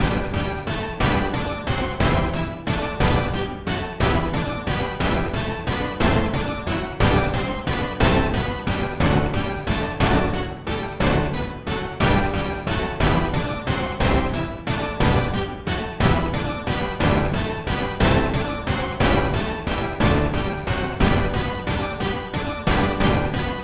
orchestra hit

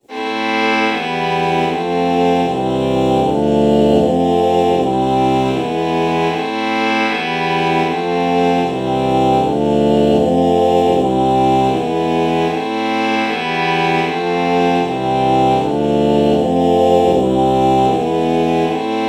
violin lpc false chord morph

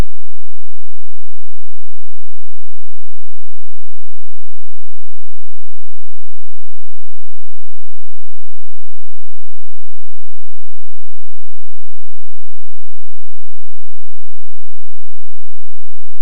bass lead bass

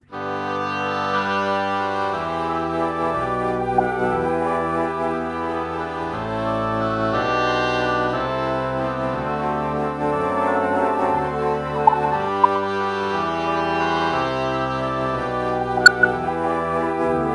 alto flute oboe morph

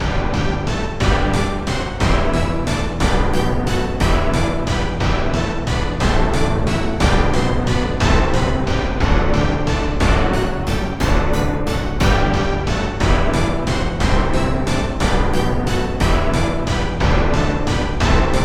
orchestra hit

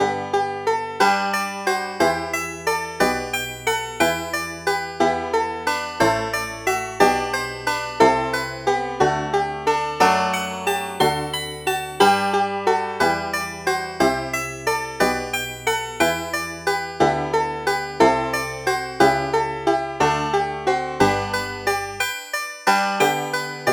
banjo

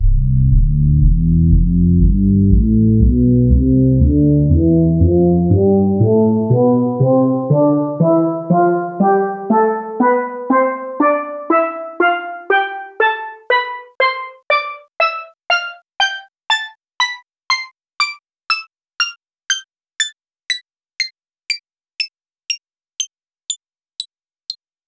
steel drums tonleiter

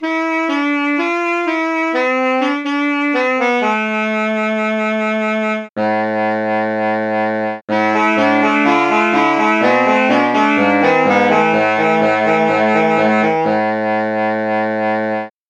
alto sax

